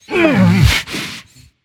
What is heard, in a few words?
ouch; smite; ymir